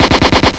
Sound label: machine gun; taisen mahjong haopai 2